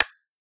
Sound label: Banging Charger Against The Speaker, Foley; Crowdsourced Sample Pack; TRUTH-HZ